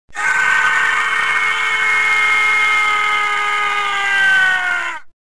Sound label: falling; Star Wars Jedi Knight II Jedi Outcast; XBOX; reelo